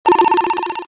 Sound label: science fiction radio receiver signal received